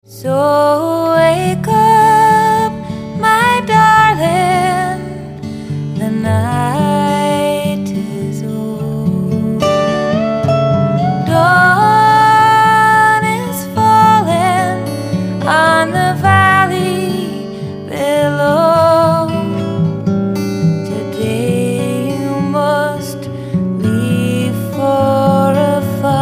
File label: Irish Waltz